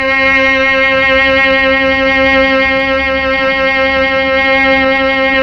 STR, Melo. Cello, Slow Cello

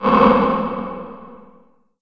LH R909, REVRB LARGE HALL; TR 909

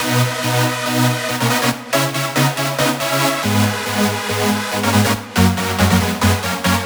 140BPM B; Vengeance - Essential Clubsounds Vol.4

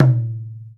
Pakawaj Open

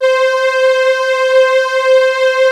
STRINGS, D - 70; Rubber Chicken Software Sample Bank

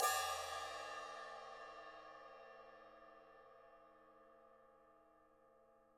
Open Hat up3; EMU PX-7

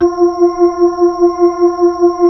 B-3, Roland Keys of the 60s and 70s, Brite

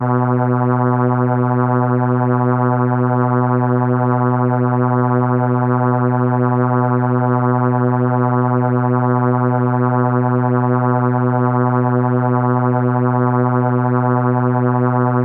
Horn B3, ARP Solina

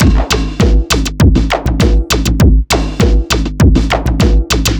distorter 100bpm

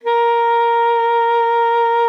A#4, alto sax; Xsample VOL-2

